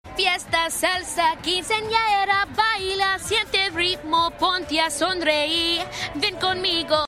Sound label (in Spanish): fiesta salsa